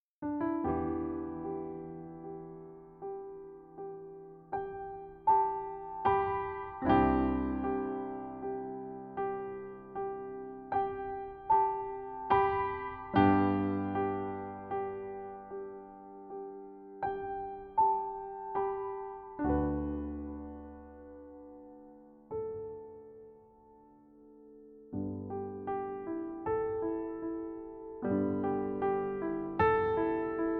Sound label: Peaceful Piano Cover